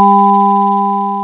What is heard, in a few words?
decrescendo